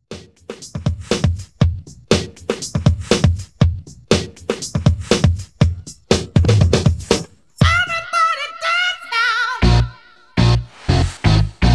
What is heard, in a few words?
Redrum 120 Bpm